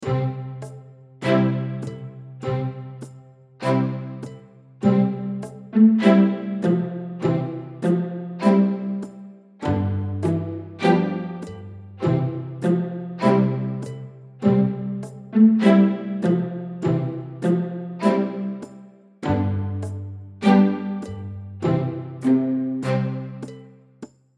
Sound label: London Bridge SLOW Tempo, ukulele; Rainbow Ukulele Play-Along